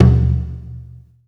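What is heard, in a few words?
Malaysian PERCussion B1; USB Soundscan vol.60; Asian Traditions; AKAI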